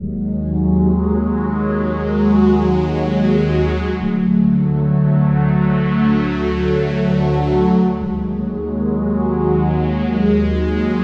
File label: VSM IV Meek Phaser; GForce